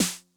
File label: Snare Mid Tuning High Tone Max Snap, 909; classic machines